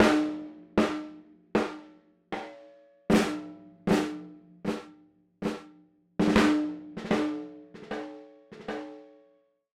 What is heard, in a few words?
GARAGE ROCK DRUMS SNARE 3 TYPES VELOCITY